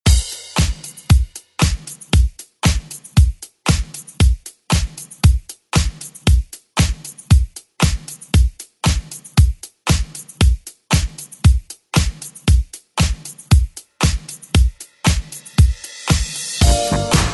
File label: 80s Re Drum; DJ Mhark